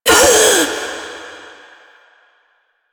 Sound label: Ring Fail; Warframe; Jade Shadows; Quests; Birth Minigame